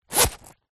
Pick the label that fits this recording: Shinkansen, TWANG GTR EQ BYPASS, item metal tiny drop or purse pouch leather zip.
purse pouch leather zip